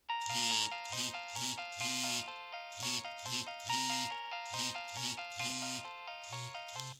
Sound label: nokia 6100 ringtones nocturne